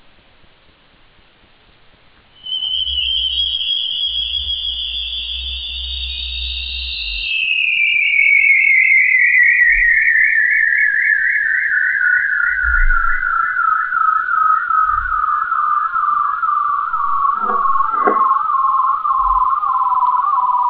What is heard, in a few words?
Bearded Seals